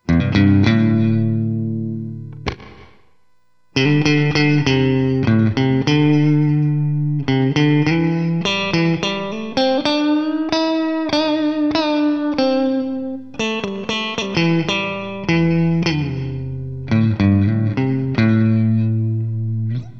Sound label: guitarport